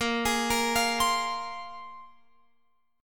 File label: Bb 7th Suspended 2nd Bb, Guitar Open F; Bb7sus2 arpeggiated; x,17,20,17,20,19